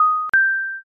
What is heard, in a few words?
elevator